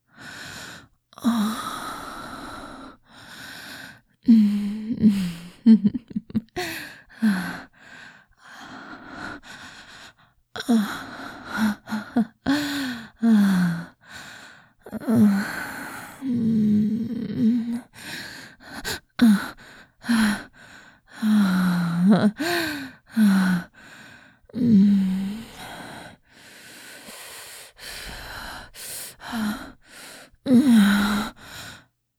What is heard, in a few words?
Breathing - Giggly and content